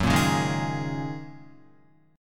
F sharp 6th Add 9th F sharp Guitar; F#6add9 strummed

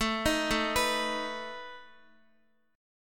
A Suspended 2nd Flat 5th A down Guitar; Asus2b5 arpeggiated

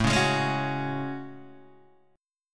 A Major 13th, Guitar, Open D; chord

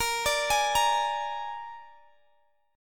Bb 7th Bb down Guitar Half Step Down

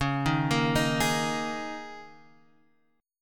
Ab Suspended 2nd Suspended 4th Db

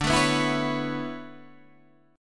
B Minor 11th D

